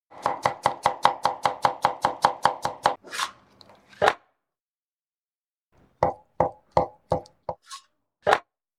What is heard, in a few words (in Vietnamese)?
Tieng cat rau cu qua trong nha bep